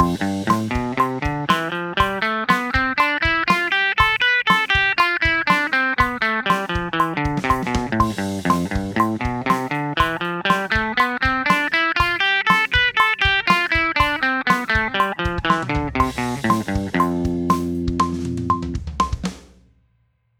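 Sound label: Am Lydian bpm120 eighth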